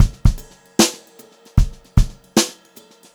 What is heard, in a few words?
Brushed Drum Loops